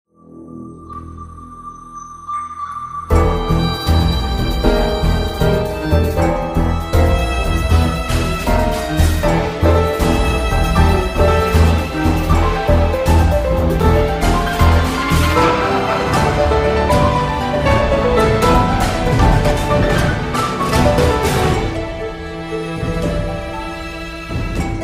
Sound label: Battle BGM